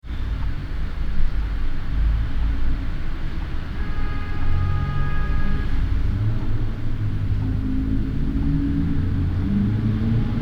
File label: reference window traffic 15 db med urhoejt